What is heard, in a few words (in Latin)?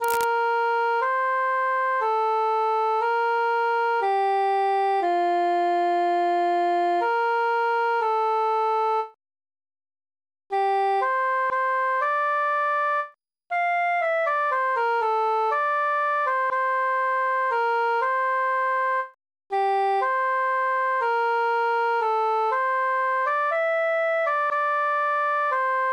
cantus solo